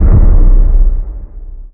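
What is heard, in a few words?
Collision Interior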